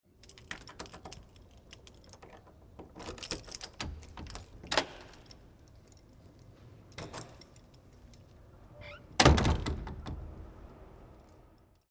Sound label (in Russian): Zamok otkryvajut